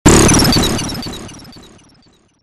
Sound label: sound design negative lose hit hard synthesized; zapsplat